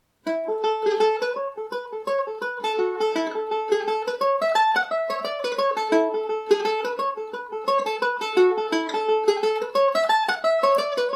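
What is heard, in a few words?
A major, Irish mandolin; red haired lass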